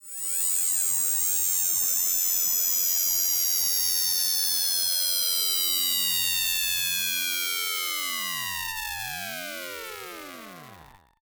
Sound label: Science Fiction Sci-Fi Electronic Powering Down